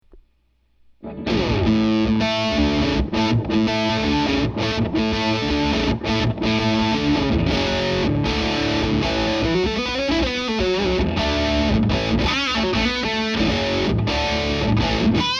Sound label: Les Paul Drive; egnater